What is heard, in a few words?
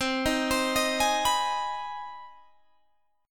C Minor Major 7th Sharp 5th, Guitar, Open G